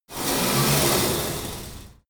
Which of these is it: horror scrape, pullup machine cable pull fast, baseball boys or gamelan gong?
pullup machine cable pull fast